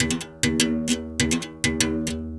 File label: Tar, World Percussion